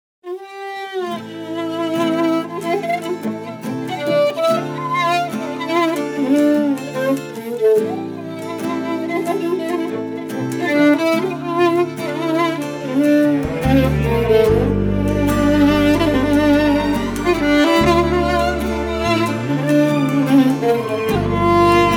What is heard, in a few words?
Ringtone